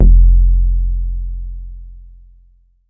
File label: synthy round, jungbass